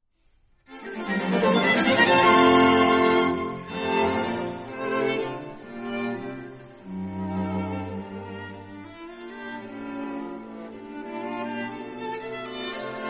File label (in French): Quatuor en fa majeur, quatuor à cordes